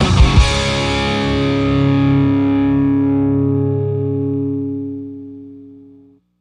Sitar

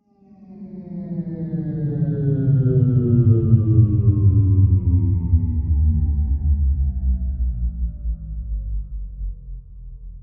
spaceship engine descend; a dump in the dark

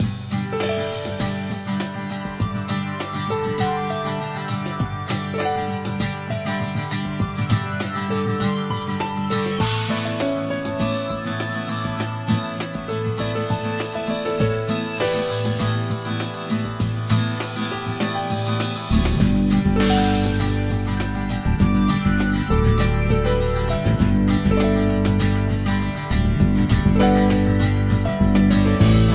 Little Guitar; Yu-Gi-Oh! GX Tag Force 3; 2008 psp

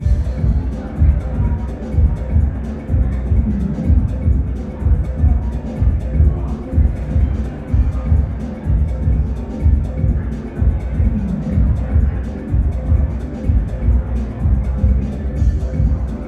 Nightclub Theme; Tropico 5; Waterborne; Building

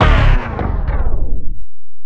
DJ Disco Stop

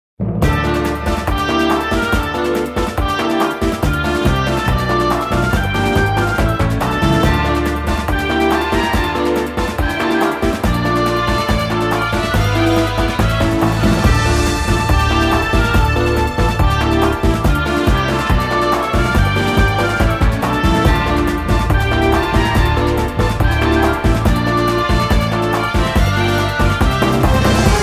Dungeon; quiz magic academy vii original soundtrack

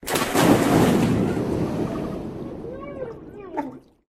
Water splash; friday the 13th killer puzzle

